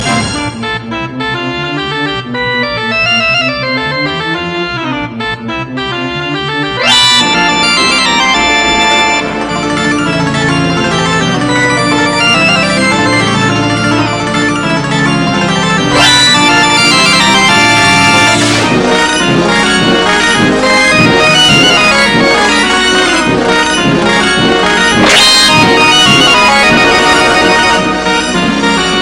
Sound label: waltz